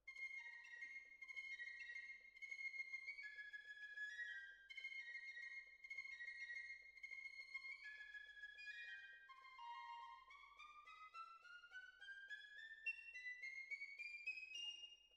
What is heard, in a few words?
Violas, jazz for piano